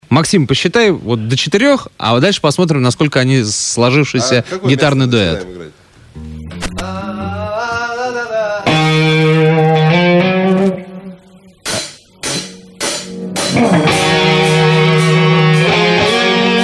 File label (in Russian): gitarduet